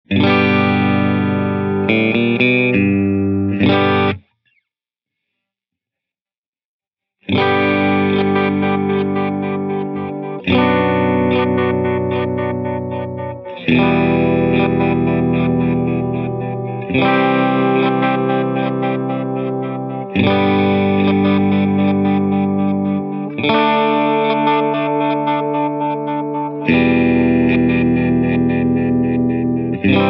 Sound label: Stutter Chop